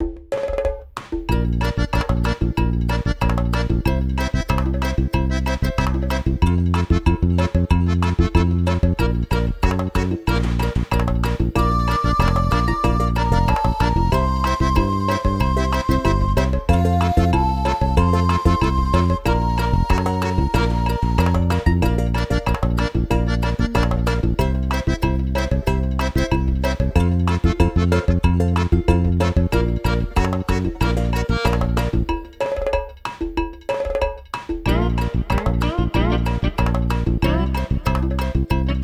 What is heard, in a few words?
music loop; SpongeBob Patty Pursuit